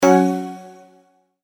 multimedia tone exclamation; McKinney Sound

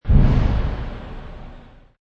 Release Valve; Mechnical hydraulic servo release sound with plenty of low end

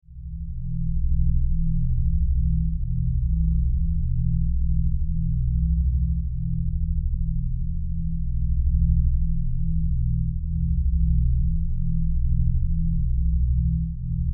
Science Fiction Low Buzz Flange; Deep Tones; Sound Design

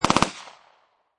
Machine Gun Mp40 Short Burst

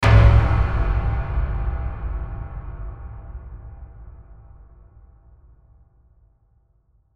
Hit Metal Impact Reverb; Metallic

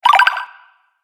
Fantasy Game Collect Sound, video game

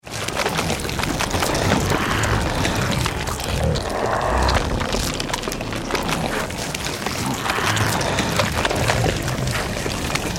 Horror Monster Zombies Attack Eat Flesh; Zombies (Sound Effects:Horror)

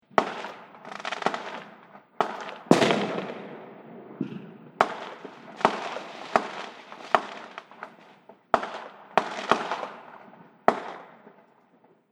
Fireworks Mixed Firework Display

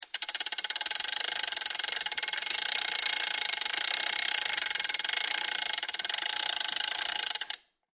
Chain Retract; Industry; Blastwave

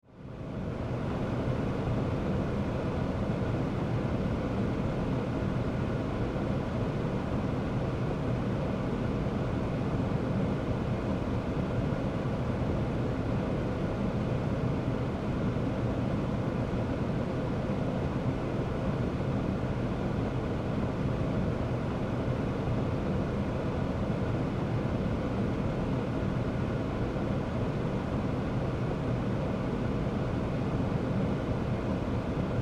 Household Air Condition Rumble; Air Conditioners

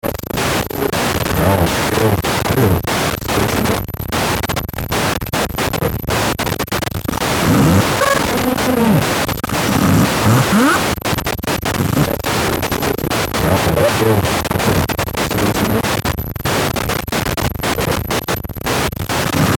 Radio Transmission Distortion Static Signal; Communication; Blastwave

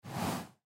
Horse Breathe Out; Nose Fast; Horse Sound Effects; Animal

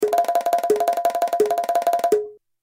Cartoon Drums Pitter Patter

